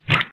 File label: landing